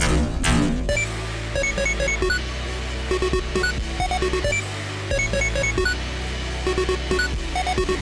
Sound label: sticky keys